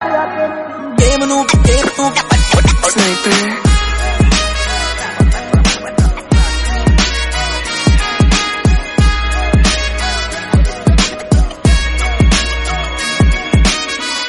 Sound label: BGM Instrumental